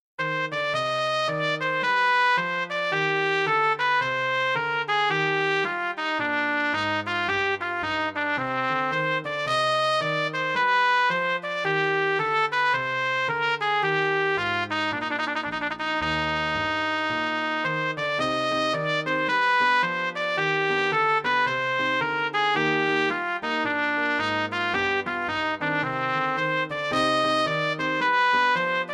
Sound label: trumpet; Cmusic Production; virtuelle Solo Trompeten; Solo Trumpet 1; Solo Trumpet 3